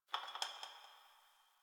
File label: Brick Crumble